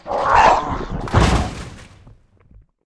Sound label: ghoul berserk dead